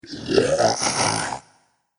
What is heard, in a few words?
Beast Monster; horror; Andrew Fleming